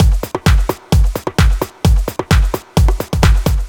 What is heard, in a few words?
Drums, 130bpm, Nu Dance Electro; Zero-G; Soundclash; Combi Loops; The Oldskool